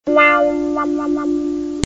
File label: WAH WAH, GUITARS